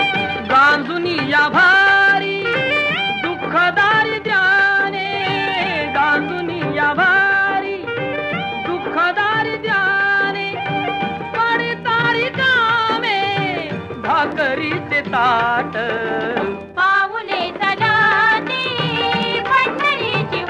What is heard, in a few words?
Bhakti Geet